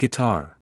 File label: guitar